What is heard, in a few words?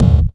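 GOGO kick, GO GO DRUMS; Crypton - DrumBase5000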